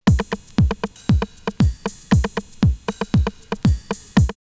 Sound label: Ting-A-Ling 117, drumloops Rave; X-Static Goldmine; 90 s Sample CDs